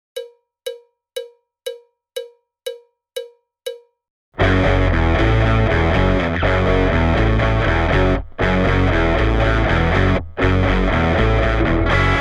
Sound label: - no drums